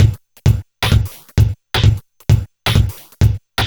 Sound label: Beat (130BPM), techno; techno drum fx